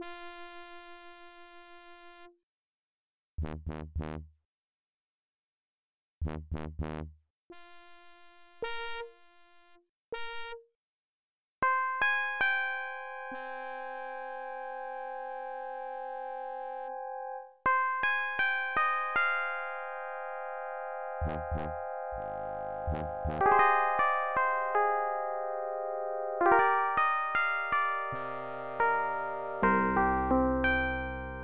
Music; Dungeon Master II

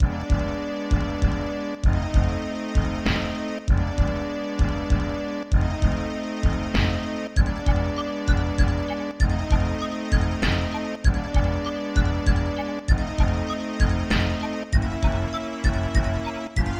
Music

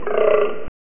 Attack (Magenta Worm); Dungeon Master and Chaos Strikes Back; Amiga

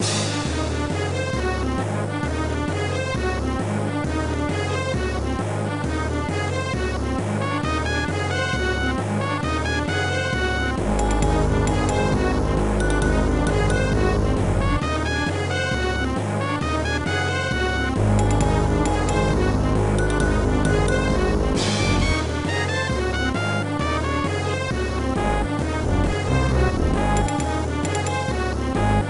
Music; Dungeon Master II; Macintosh

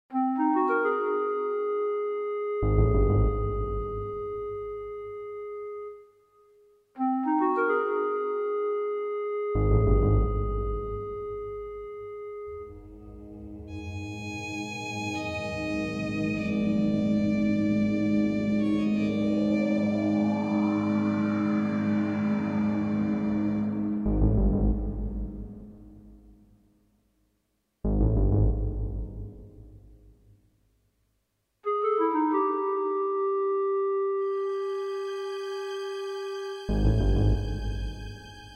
Music; Dungeon Master Nexus; Sega Saturn

